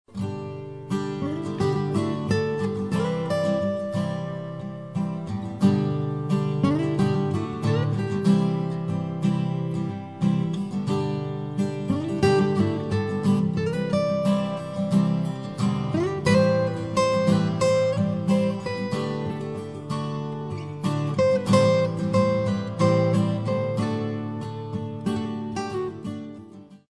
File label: acoustic guitar